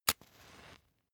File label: match light short